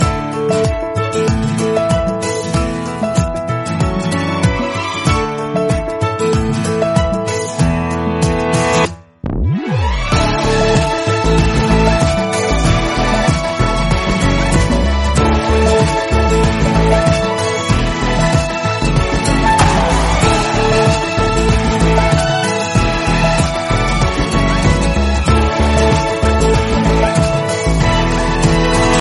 rhythmic background music tone

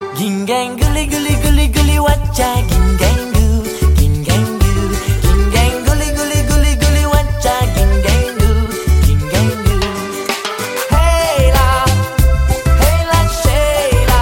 funny musical tone

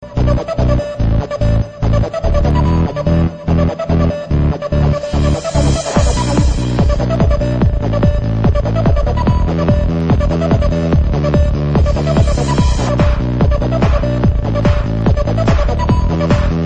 techno flute ringtone

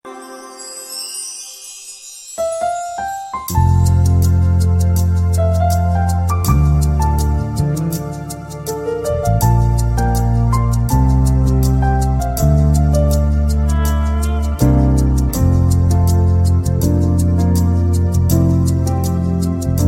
sad piano ringtone

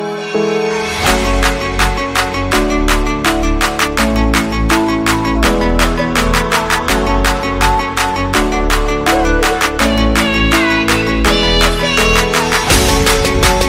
cartoon anime ringtone

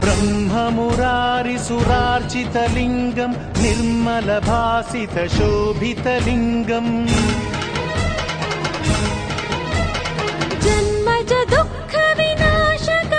devotional ringtone, bollywood; shivratri special; bollywood ringtones

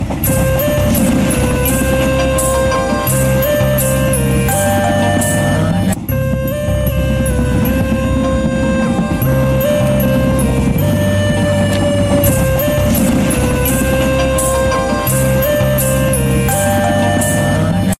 music ringtone, bollywood ringtones

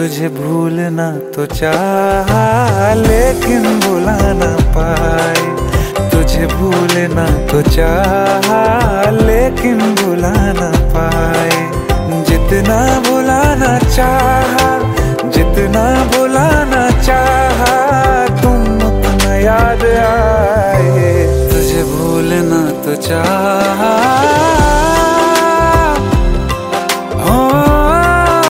hindi ringtone, bollywood

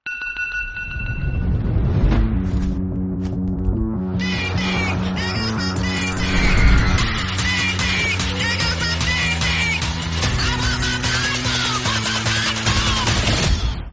fast music mobile phone tone

